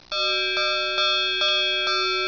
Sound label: train bel; 12000 Graphics Pack - A Complete Graphics Collection For Your PC